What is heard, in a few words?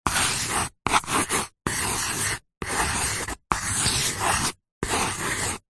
office pencil drawing on paper; zapsplat